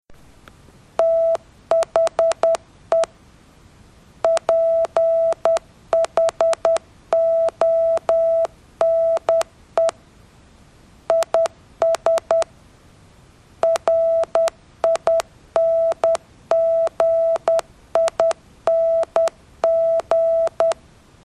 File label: morse ringing